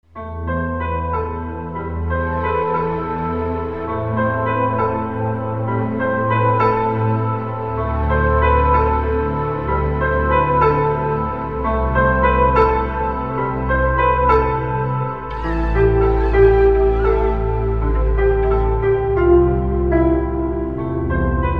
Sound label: piano